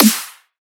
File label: Snare Dubstep; Trap and Dubstep